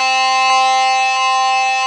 synthono C4

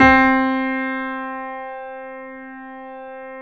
C 3, Keyboards Acoustic, GRANDP ST; USB Soundscan vol.17

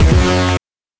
BANK2 SFX, NES DPCM; MIDINES

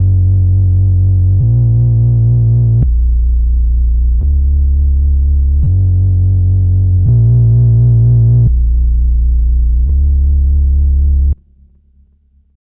Poppy Bass, Drum n Bass; KJ Sawka; Drum n Bass & Beyond

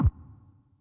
scrub, Yamaha Tenori-On; Scrubkit

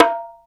Bongo Lo, Percussion Kit; Akai MPC2500

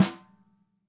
Ludwig Black Beauty Snare pp Room; Sonic Foundry; Sony Creative Software; Vital Drums; 1969; Snare One-Shots

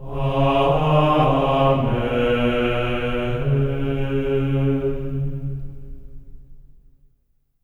AMEN C#4, voices; Spectrasonics; GR AMEN

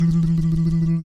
VOC PERC, Vocal Planet Jazz FX BASS PERC

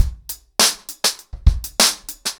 100BPM, ACOUSTIC DRUMS

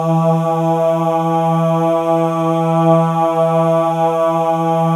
MALE AHS, Symphony of Voices; Spectrasonics

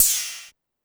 Ride 808 Down, Pitch Env Various, Clavia DDrum; Clavia DDrum-AT

